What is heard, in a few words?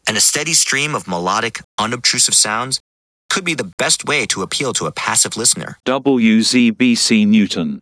Melodic Unobtrusive Sounds